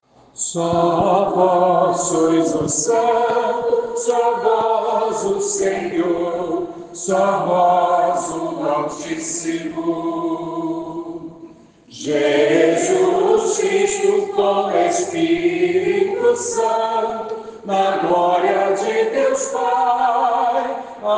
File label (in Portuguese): gloria amem homens; coral sao francisco; missa vigilia pascal